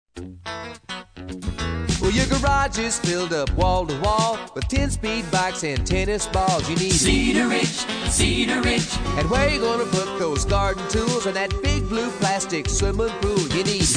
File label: Jingle